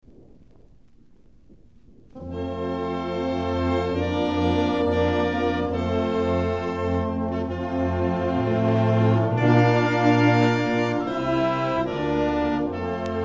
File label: Anthem Music